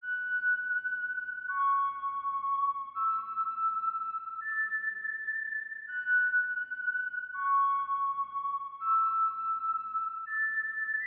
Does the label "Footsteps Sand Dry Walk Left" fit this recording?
No